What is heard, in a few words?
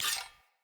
Short Sword Fast Equip; Weapons; Player